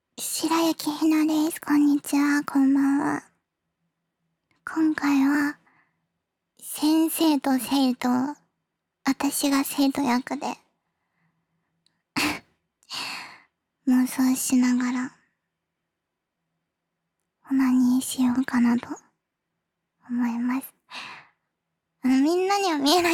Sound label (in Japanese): 先生と生徒の禁断の生中出し